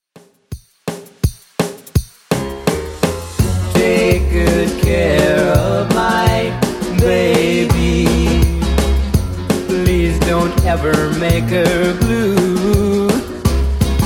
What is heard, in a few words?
50s Redrum 167 bpm